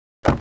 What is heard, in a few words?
door close; DAoC; Toa